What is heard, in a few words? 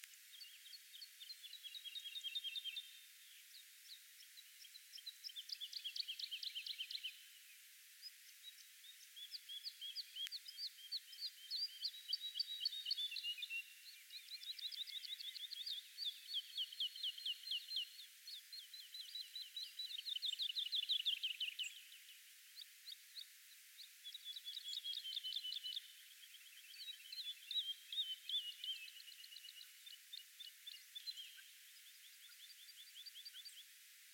Trädlärka Lullula arborea